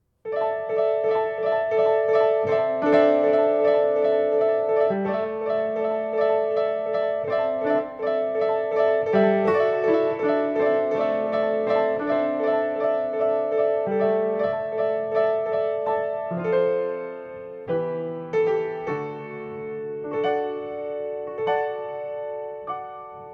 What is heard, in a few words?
solo piano